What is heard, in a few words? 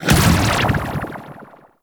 Staff Jab Hit; Warframe; Monkey King